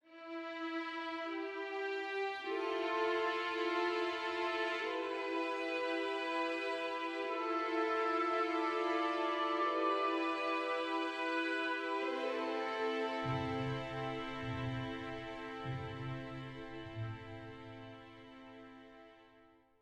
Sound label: empty village, music; Mount&Blade Warband